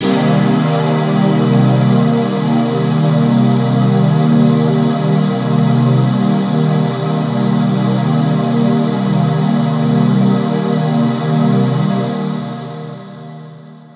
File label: pads rack pad Cmin7 ice; M8; M8JUNGLEPAK1